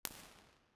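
impulse response, St Patricks church Patrington model; b-format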